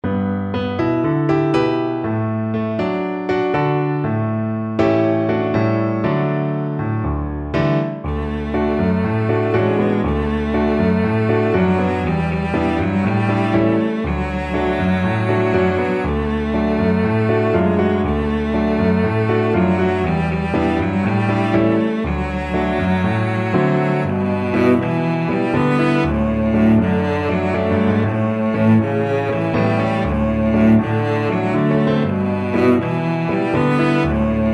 cello duet

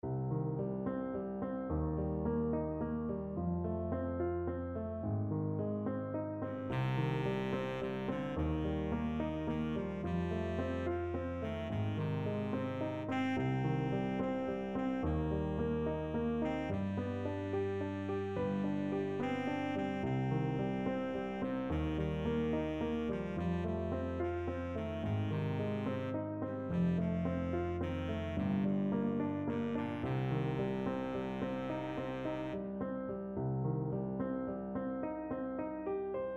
baritone saxophone

